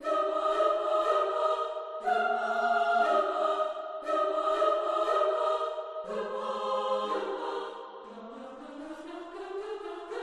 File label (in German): Musikalischer Scherz für drei Solostimmen; Graf liebster Graf